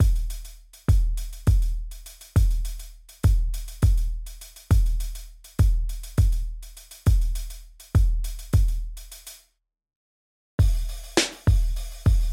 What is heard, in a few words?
Hip Hop Beats; Музыкальные инструменты